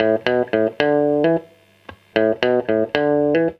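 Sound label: guitar, Roots Funk; USB Soundscan vol.41; AKAI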